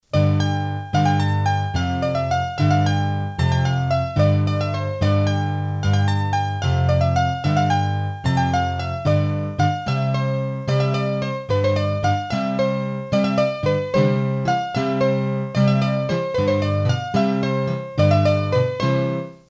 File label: Bourree, hurdy-gurdy, Auvergne